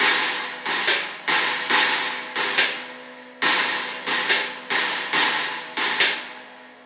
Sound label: Cymbal Pattern